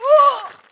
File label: female hit